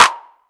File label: wet clap sound a sharp key; single hits one shots